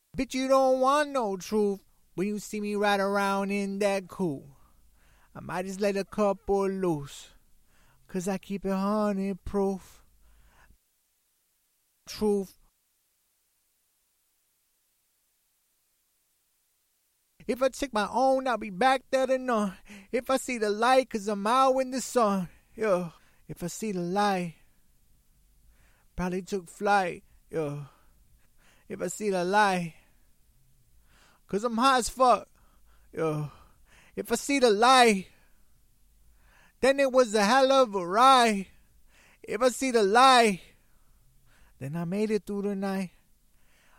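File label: hip hop 90 Am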